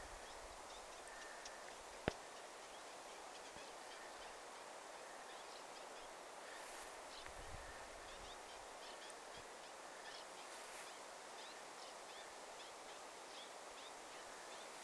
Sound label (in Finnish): hernekertun poikasen aantely muistuttaa ehka hieman rytikerttusen laulua